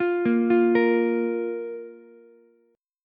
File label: A sharp 5th A sharp, Ukulele; arpeggiated; JGuitar